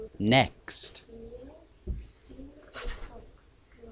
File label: clap